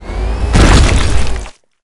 corpse, trolley; Cosmic Rage

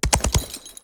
canter, horses

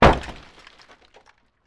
bounce, highway old car

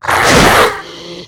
monster miss, combat enemy

